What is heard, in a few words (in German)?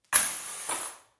Schluesselbund werfen